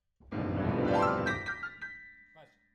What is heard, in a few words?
PIANO, RNR for piano